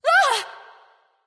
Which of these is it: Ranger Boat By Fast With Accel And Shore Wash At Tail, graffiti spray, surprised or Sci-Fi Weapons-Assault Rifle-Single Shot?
surprised